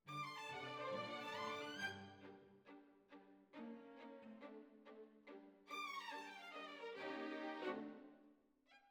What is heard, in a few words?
Violins Over